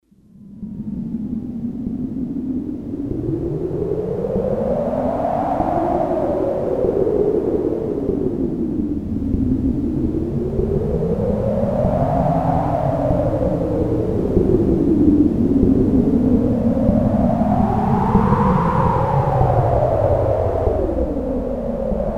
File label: Wind, Sequential Circuits Six-Trak; Factory Programs